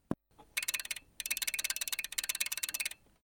Heritage Audio RAM 2000 System Master und Umschalt Geräusch

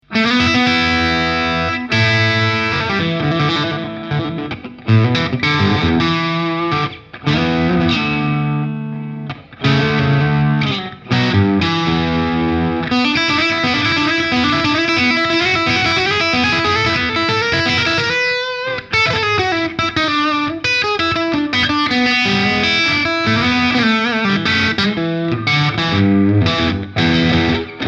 Stegpickup verzerrt bluesy

